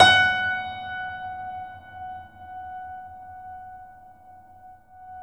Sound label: F#, piano sustain